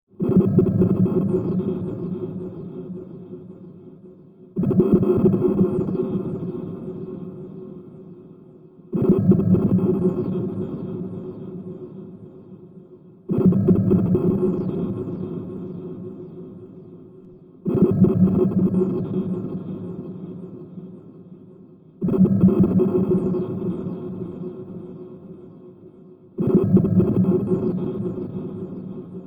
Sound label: Looping underwater sound with bubbles; which could be a diver, submarine or any other futuristic craft; Deep Sea Pump; Deep Tone; Sound design